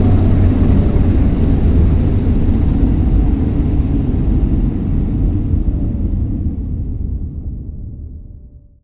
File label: car stop; vehicles; garrysmod; sincar